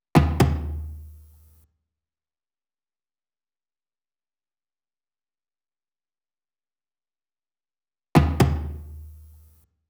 perc loop 60, Pop, Drums; Loops; Slate; SD PASADENA Pop Sample Pack

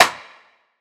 snare woody; one shots; SEISMIC Drum Sample Pack; Slate

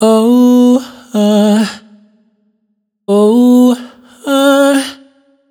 riff Oh Huh 78 E wet, vocal; Slate Digital Omnivox Vocal Sample Pack

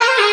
vocal sweep, Ab; SD AURORA Sample Pack; Slate

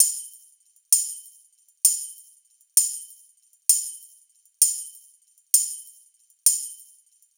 hat loop 130, Drums; Pop; Hat Loops; Slate; SD PASADENA Pop Sample Pack

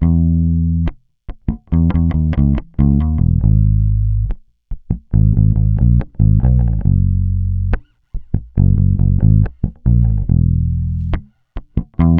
Bass loop 140 Abm, Electric Bass; Electric Bass Loops; Slate Digital BASSHOLE Sample Pack

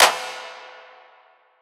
clap down; Drum One Shots; SD MIDNIGHT Sample Pack; Slate; Claps

